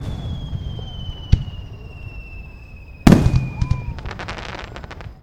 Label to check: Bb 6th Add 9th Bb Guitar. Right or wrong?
Wrong